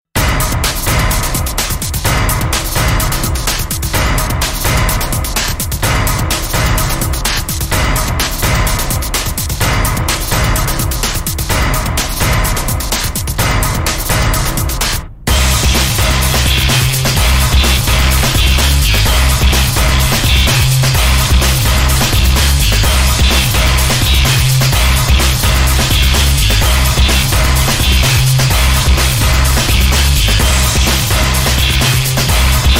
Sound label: surface tension apache boss theme; black mesa ost